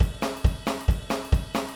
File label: 137 Zydeco Swamp Drums, Very Fast Tempo; Pop Rock; Pro Tools Essential Loops; Digidesign